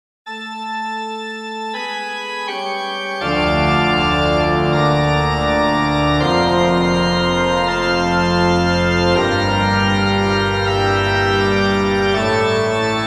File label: Kyrie Plein Jeu; Beauvarlet Charpentier JJ Journal; Messe Royale